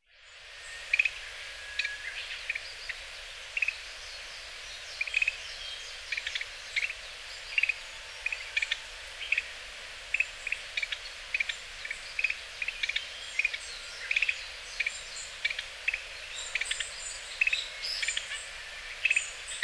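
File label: Japanese Grosbeak, Hyogo; ikaru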